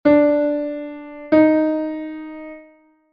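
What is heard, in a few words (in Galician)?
2ª menor RE- MIb, INTERVALOS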